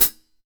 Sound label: 14, Drums; Big Fish Audio; Ross Garfield Drums VOL-2